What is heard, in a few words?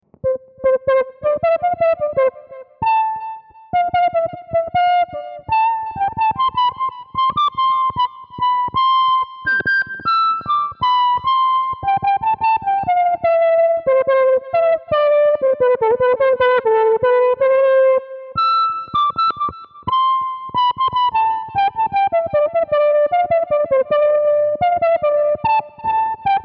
VG-99 whistle